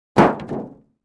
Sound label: Car Roof Impact